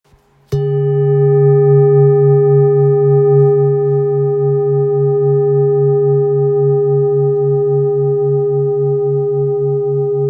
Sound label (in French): Bol bengale do diese